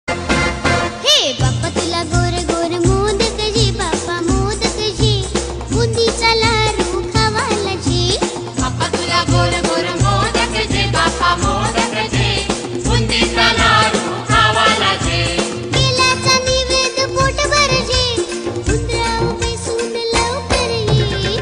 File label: Ganpati Ringtone